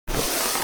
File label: Paint brush painting wood long stroke